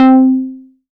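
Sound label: pluck C4, Moog Minimoog; Leads and Keys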